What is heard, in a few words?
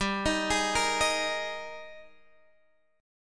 Ab Major 7th Suspended 2nd G